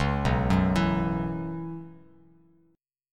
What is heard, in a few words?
B Suspended 2nd Suspended 4th, Bass Standard; arpeggiated; JGuitar